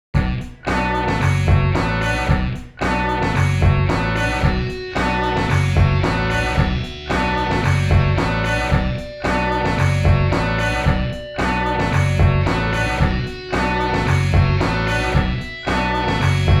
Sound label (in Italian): scherzo funky; le detour